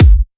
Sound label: Bassdrum